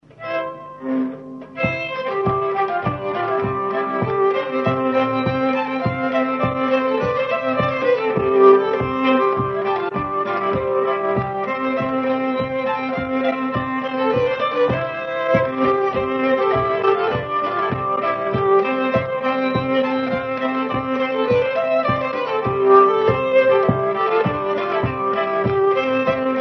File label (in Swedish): Polska; Polska A P Bjrklund